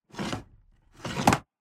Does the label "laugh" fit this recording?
No